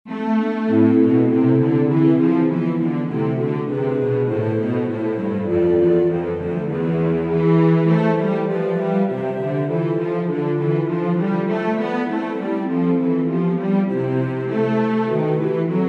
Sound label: Instrumental; Bach; St John Passion